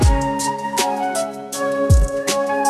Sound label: Japanese flute, lofi; musicgen